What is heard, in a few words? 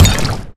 imp bullet lightballistic bubbleshield; Titanfall 2